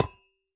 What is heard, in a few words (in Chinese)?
铜破坏声